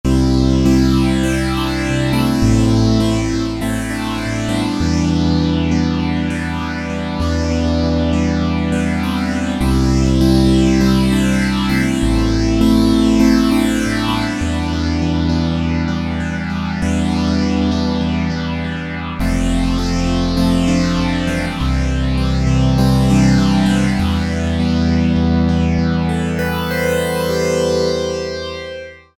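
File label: Juno Pulse Comp, E-mu Proteus VX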